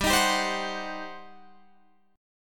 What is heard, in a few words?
G Major 7th Suspended 4th Sharp 5th, Guitar, Drop D; 17,18,17,17,19,20; down